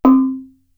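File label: percussion C2, Gamelan, KORG DSS-1; ProSamples vol.55 - Retro Sampler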